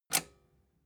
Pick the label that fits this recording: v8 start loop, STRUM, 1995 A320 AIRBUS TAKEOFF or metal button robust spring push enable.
metal button robust spring push enable